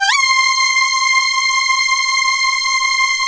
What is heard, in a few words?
lead synth